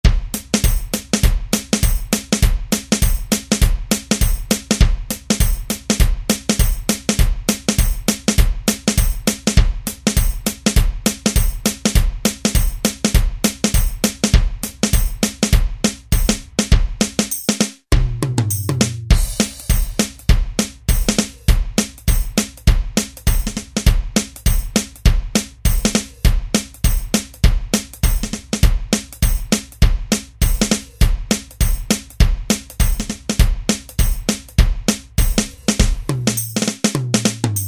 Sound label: Dixie, Alesis SR-16 Preset Patterns